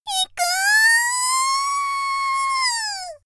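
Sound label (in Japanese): 少女絶頂「いくーーー！」; 元気な少女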